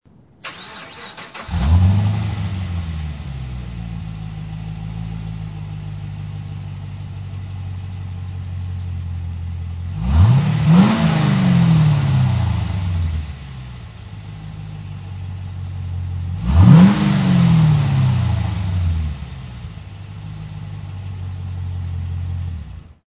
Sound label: thrush Turbo